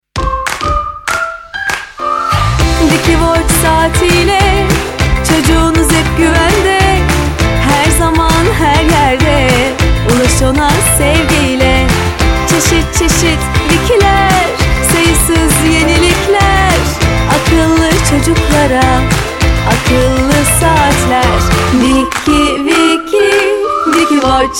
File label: jingle; wikywatch